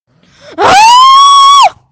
screaming like crazy

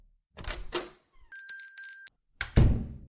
door w bell

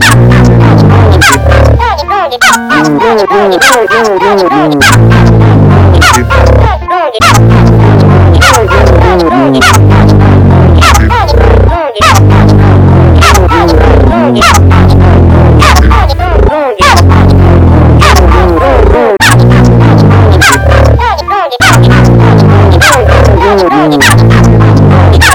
fountain black midi

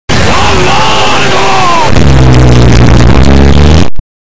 bass boost and earrape version; za warudo

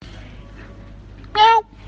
Miau; Charles

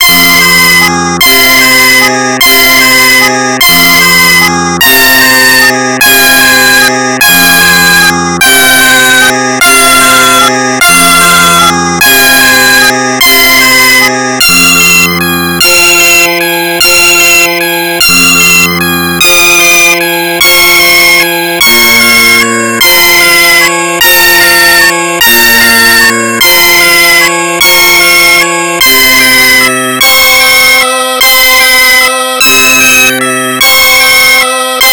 piano; Jeux Interdits

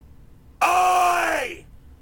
oiiiiiiiiiiiiiiiiiiiiiiiiiii

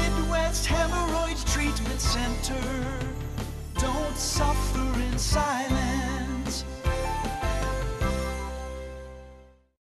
jingle; Midwest Hemorrhoid Treatment Center; TV Show Soundboard

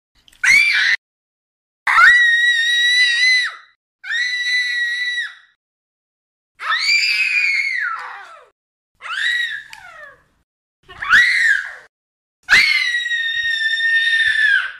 young Girls Screaming

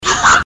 screech

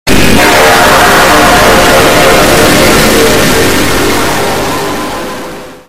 boot loud

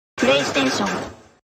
playstation trailer sound effect

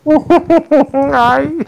hahaai

